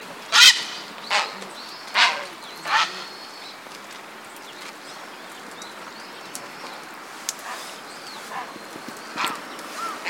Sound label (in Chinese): 蒼鷺 Ardea cinerea